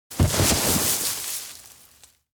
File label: hedge crash; koyomi; anemoi パッケージ版 1.1